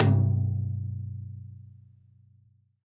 TD-20 Tom B, Roland TD-20 Toms

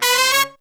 Pop & Funk Brass, 096; Best Service ProSamples vol.25; AKAI

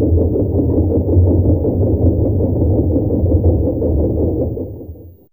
Noise F1, Fairlight CMI IIe Effects; Effects3

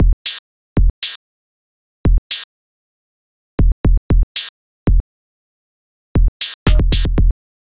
Robot Thunder, Modular Electronica

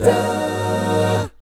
AB MI7 AA, GUY CHORDS; Spectrasonics; Vocal Planet; Jazz FX

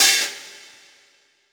Hi Hat Open; Essential Percussion

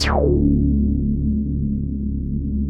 DEEP BASS; Masterbits; Dance Trax

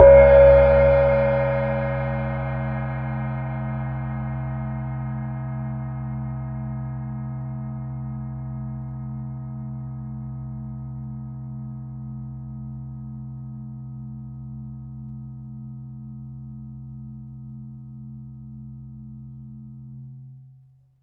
Cymbal Hit, Impact Drum Hits

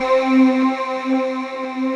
LIGHT VOICE, EMU; Rubber Chicken Software Sample Bank

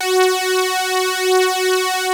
POLY SYNTH; Wizoo - Powered Wave

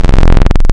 Synth Basic, wavetables; Animoog

